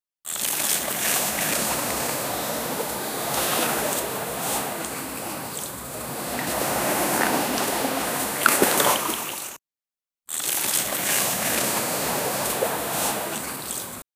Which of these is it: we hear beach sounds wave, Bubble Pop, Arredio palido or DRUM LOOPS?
we hear beach sounds wave